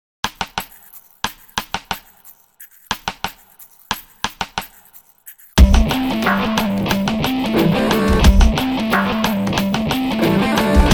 Outro Music

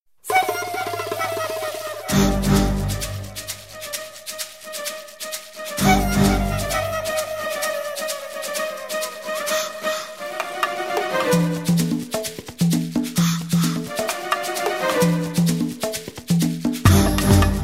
Instrumental Music

